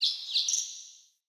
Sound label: Bird Double Chirp; Pothia Bird